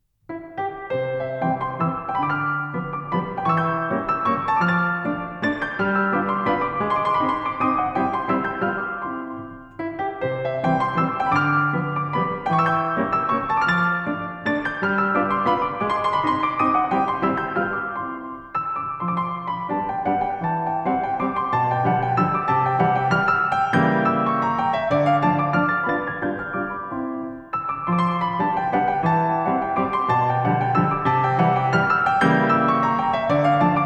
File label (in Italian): Valzer pianoforte; von Musikdirector Jos. Stadler